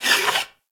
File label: Moa Leg Movement; Enemies; Warframe